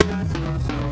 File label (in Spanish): Redoble